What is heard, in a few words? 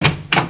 Door; Heroine Iysayana Trilogy; Chapter Three